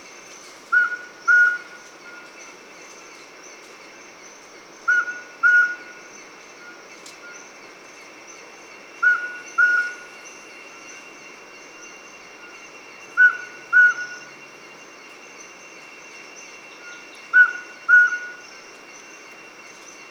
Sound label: Mountain Scops Owl Otus spilocephalus, mountain scops owl call